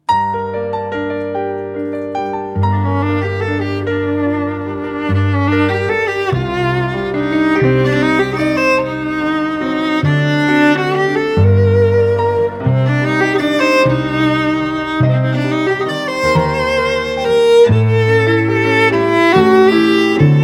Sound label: waltz